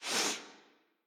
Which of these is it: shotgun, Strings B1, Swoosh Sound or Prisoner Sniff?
Prisoner Sniff